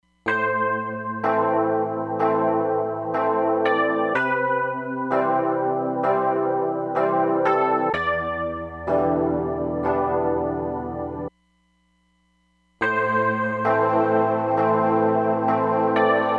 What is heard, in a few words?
Phased Long room; Roland DEP-5; Factory Patches